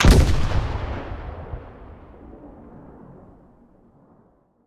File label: explosions; gmod; lvs framework